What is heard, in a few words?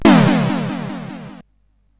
Remix, sid CSG8580R5; hvsc